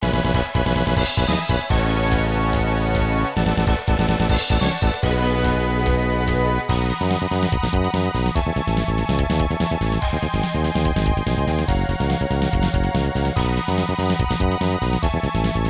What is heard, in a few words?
Ending; 2nd Loop